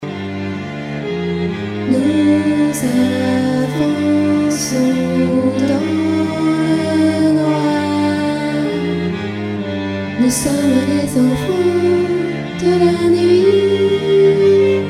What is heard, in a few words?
soprano